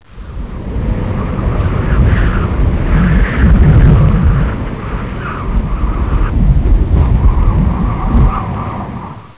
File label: wind